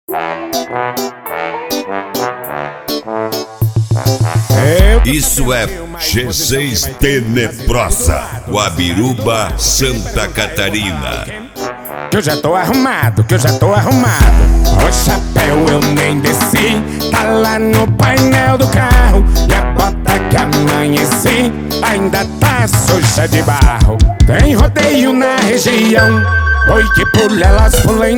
sertanejo automotivo

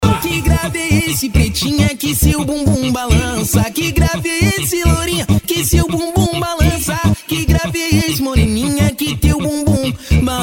funk ritmado

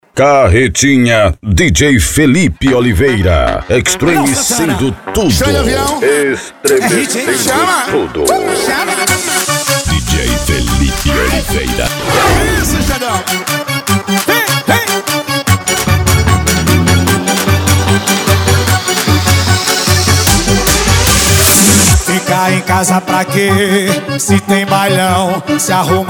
forro

